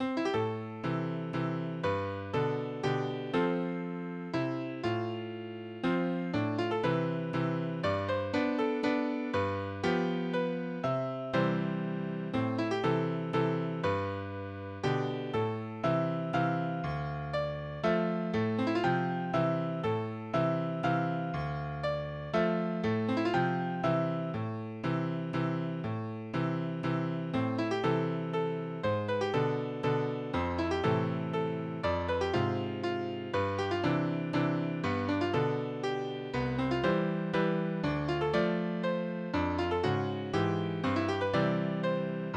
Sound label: C Major